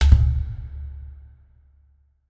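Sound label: drone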